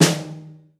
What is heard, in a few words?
Snare Soft